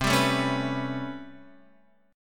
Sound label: A 9th down Guitar Standard, chordshape; A9/C strummed